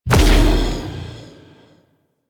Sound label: Edge Kick Large; Warframe; Warframes; Pacifist